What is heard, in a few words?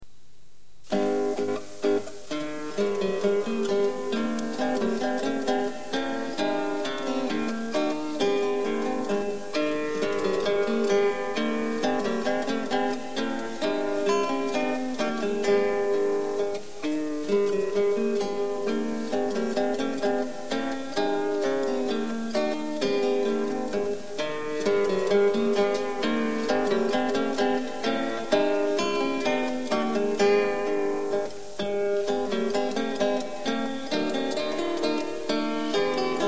bourree, guitar